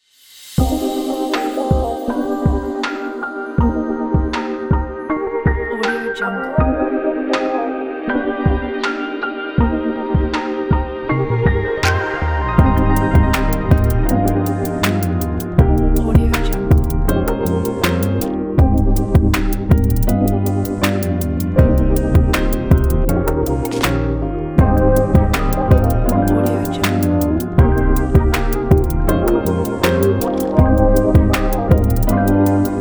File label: chill lofi hip hop